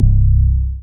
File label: BAS FM DX-7